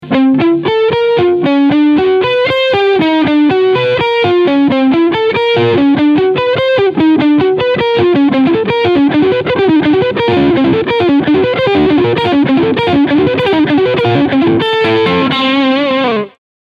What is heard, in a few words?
ARPEGGIOLINES